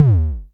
Klone Dual Percussion Synthesiser tom

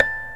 BOTTLES; OMI - Universe of Sounds

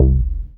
DM BAS, Dance Mega Synth

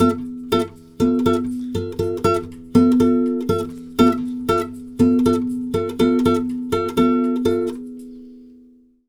120 BDDIM, Brazil Bossa; USB Soundscan; AKAI

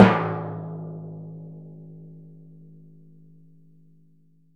TMP A#, Synclavier Percussion

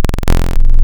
Phase Shift Static, wavetables; Architecture 32

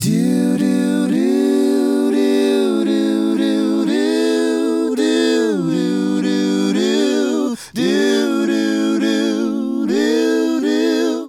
Male Group, Hip-Hop and RnB Vocals

